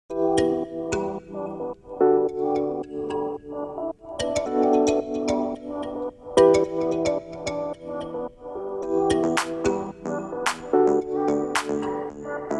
Travelling Syncopated Jump Beats Music

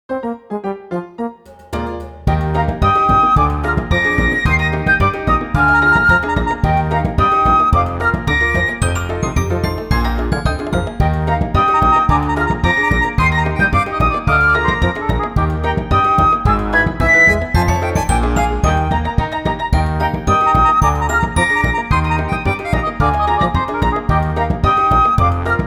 Jumps Continuous

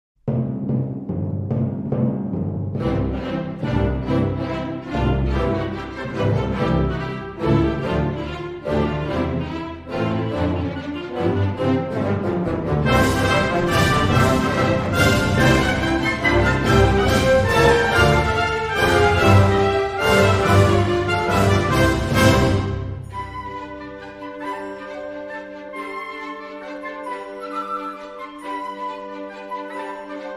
Character Dance Male And Female Mazurka; Pan Voyevoda

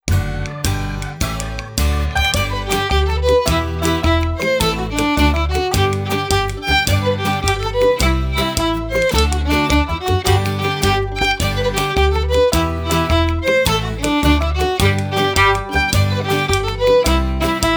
Galops and Skips Continuous

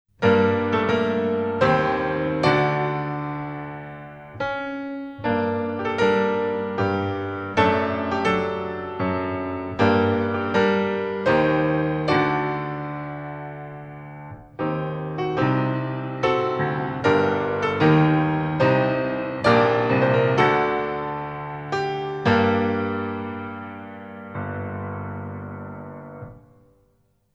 Character révérence

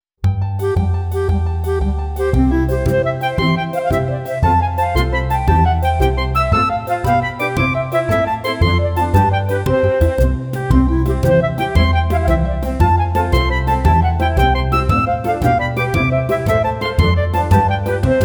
Springs Solo A

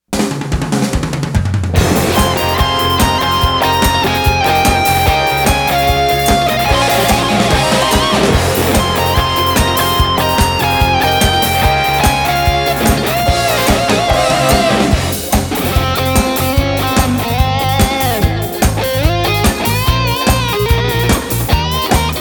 Exit Music

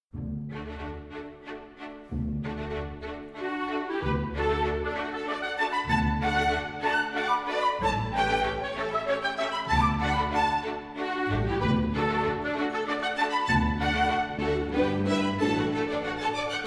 Walks Solo Variation; Variation 3 From Pas De Quatre

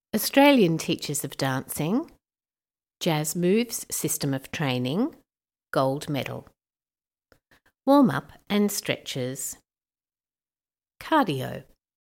Cardio

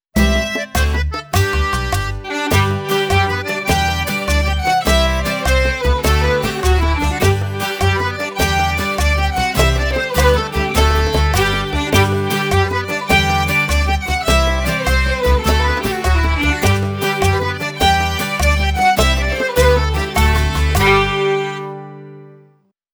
Skips Solo

